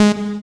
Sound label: SYN POLY; Sound & Vision - Gigapack I CD 2; JD 800/990